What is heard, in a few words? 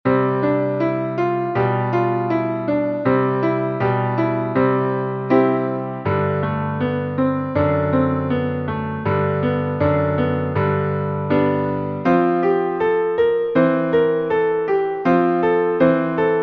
Two-Handed Pentachords, Piano